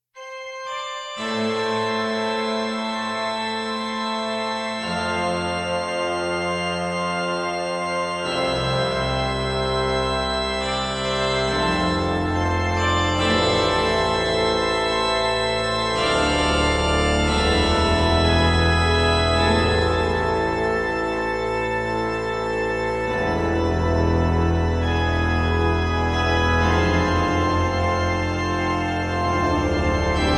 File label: Organ Works; Arma 2